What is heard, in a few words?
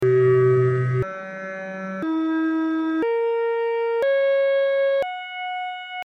microtonal variations of the all-interval-chord; akkord 13 c-2 g-2 e-2 bais-1 cis-1 fis-1 sukz